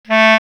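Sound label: Bass Clarinet A3; dsp2008 sound library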